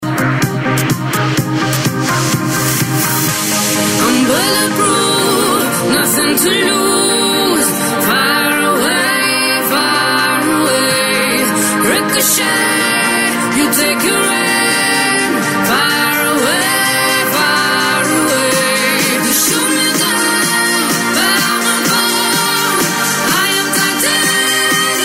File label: Closeup toothpaste 12 Hours Fresh Breath TV ad song